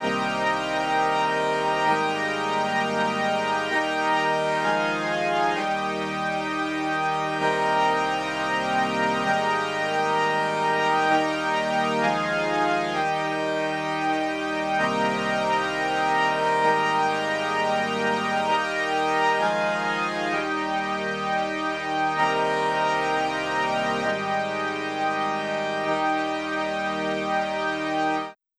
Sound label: Pad Wide Chorus